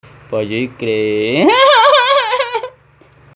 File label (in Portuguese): risada ladrao; cstrike; ultimate sounds